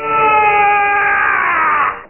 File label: Ressurect Death; Realmz 4.2.2